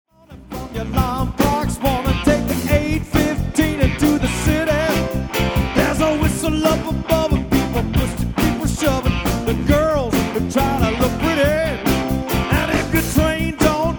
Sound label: trio short rock medley